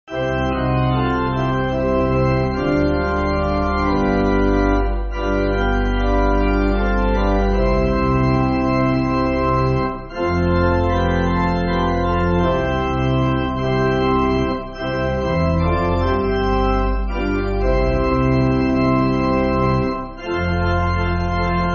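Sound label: Pipe Organ, church music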